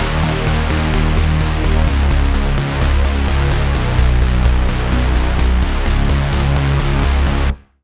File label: Bass, Sylenth1; Bass - Rumbling Force; Routed Sound; Sylenth1 Expansion - Star Scavenger Vol.1